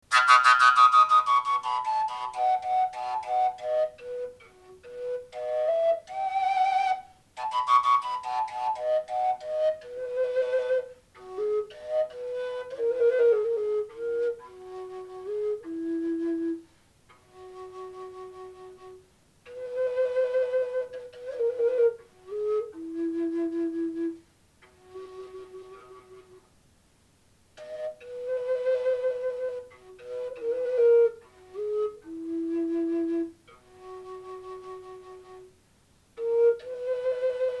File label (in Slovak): fujara H